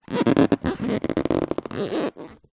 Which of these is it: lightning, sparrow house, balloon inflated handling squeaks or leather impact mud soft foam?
balloon inflated handling squeaks